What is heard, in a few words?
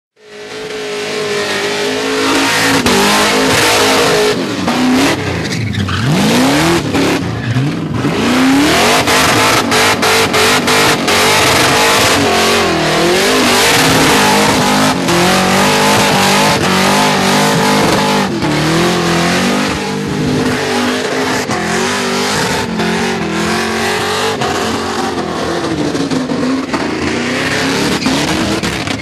blown vl burnout